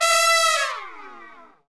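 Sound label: trumpets FX, Brass; Roland L-CDX-03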